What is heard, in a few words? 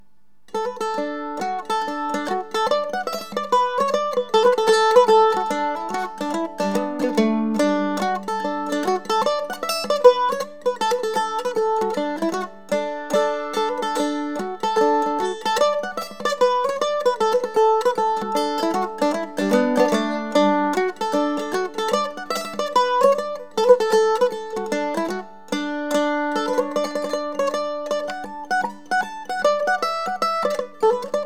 standard myrtle hornpipe, mandolin; Peter Coombe Mandolins and Guitars